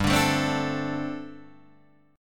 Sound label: G Suspended 2nd Suspended 4th, Guitar DADGAD